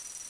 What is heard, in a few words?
BAR MOVE; SSTEEL